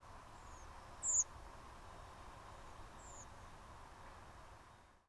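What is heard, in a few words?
secondary modulation, Redwing Turdus iliacus, Several Redwing NFCs with secondary modulations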